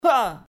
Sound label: noodle whip again